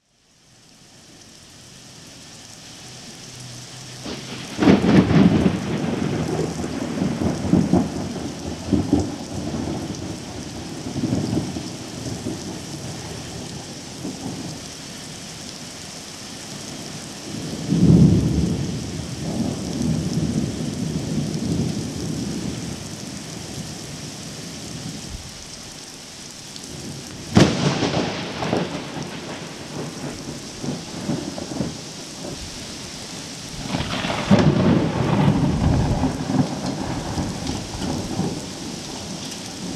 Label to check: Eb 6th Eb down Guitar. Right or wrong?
Wrong